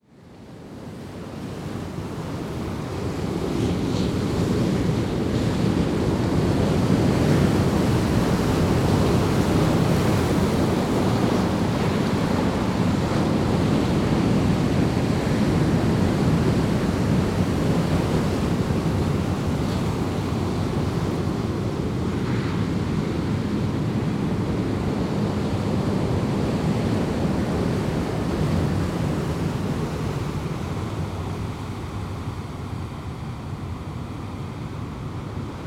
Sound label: Seaside Cove Waves